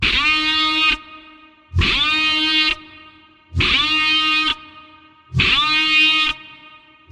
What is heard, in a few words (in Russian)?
sirena; battlefield